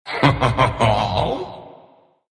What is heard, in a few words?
crown laugh ru